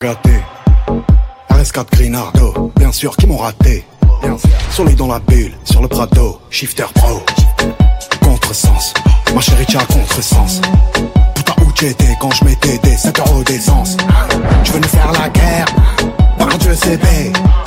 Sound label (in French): ringtone